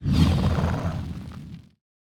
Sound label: Magma Spawn; AncientBeast